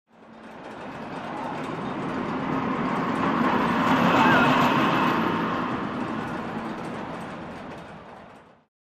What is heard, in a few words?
Carnival Rides pass by